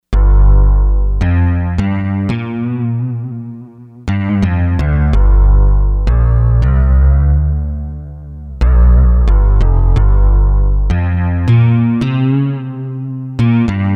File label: Smooth Pick, Fretted Insts; Fretted Insts 1